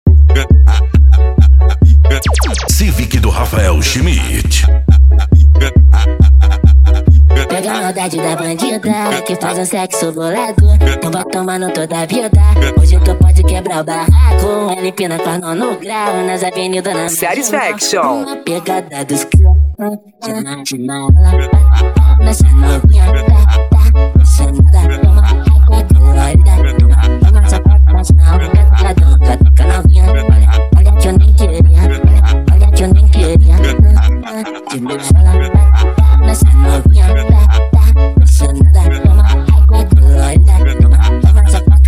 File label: funk mega bass